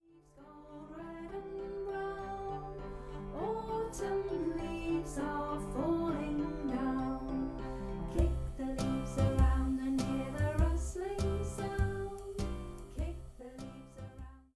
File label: Singalong